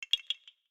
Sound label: korok move